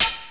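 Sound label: glass; Thief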